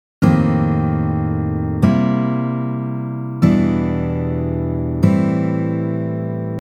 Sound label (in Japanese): せつない エモい; emo code 4types 3steps